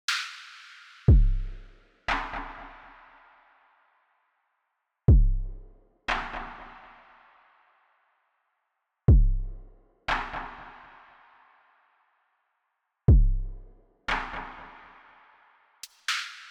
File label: Drums I; risk of rain 2 engineer edition 2